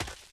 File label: grass, footsteps, run; Left 4 Dead 2